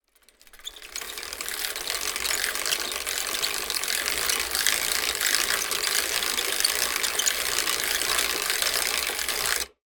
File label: transport bicycle childs peddling